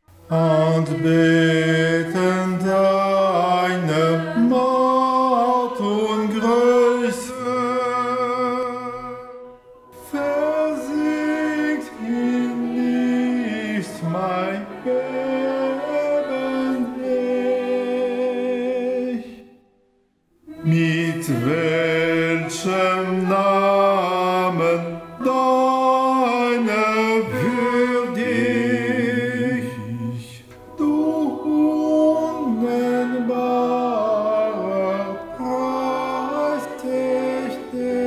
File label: Anhang Ténors